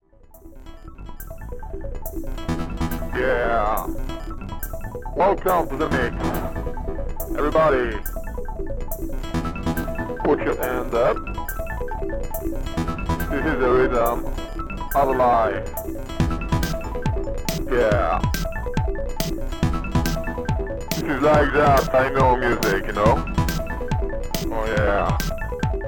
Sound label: techno